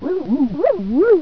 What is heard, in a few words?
WARP